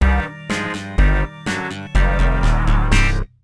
Sting Player Down; Monsters vs Aliens; NDS

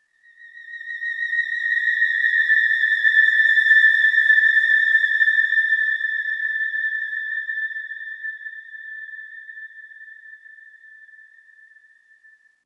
Oneshot Synth

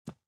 SFX run rock

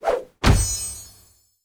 Rare Drop; Animation Throwdown

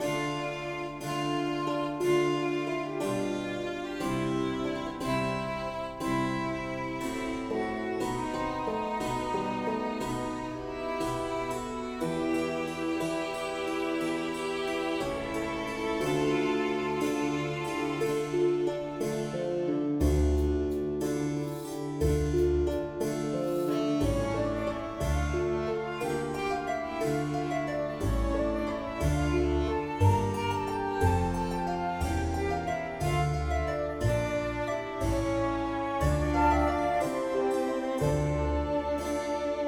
ballroom; Shadowhand; macos windows gamerip 2017

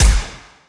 Pistol Fire Longer Tail; Robobeat

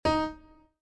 Piano; Scarlet Hood and the Wicked Wood

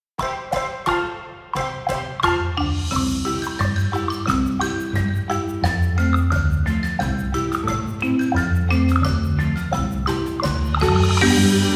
Instrumental; Busy Busy Beavers; super animal royale

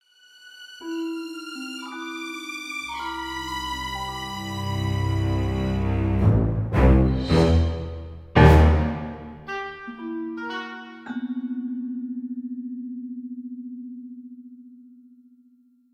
Music Time Hole; Costume Quest 2

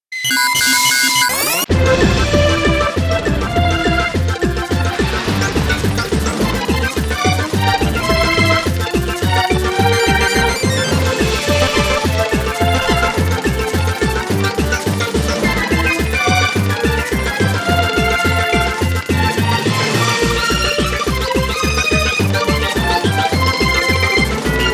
BGM Boss; Super Mario Maker

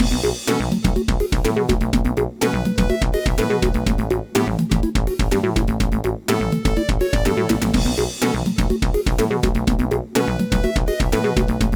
Power Up~Clear (Arrange); bomberman the music

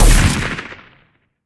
Sci-Fi Weapons-Assault Rifle-Single Shot

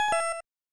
Chance Game Correct; pro mahjong kiwame 2; snes

